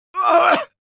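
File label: Demoman pain sharp, Spanish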